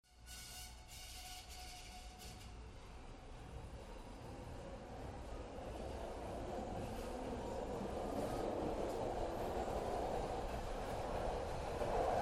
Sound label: Train Start-Away-Stop (Interior) Septa Train Starts And; Hollywood Edge 3-D SFx